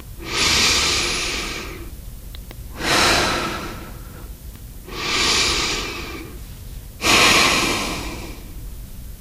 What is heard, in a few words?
dark breathing; episodic; episode 1; Source engine